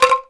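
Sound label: R8 cabasa; X-Static Goldmine 1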